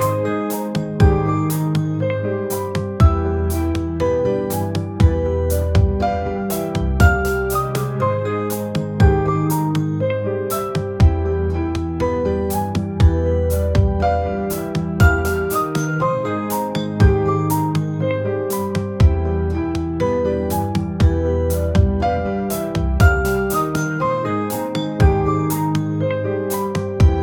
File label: anime idle mmorpg theme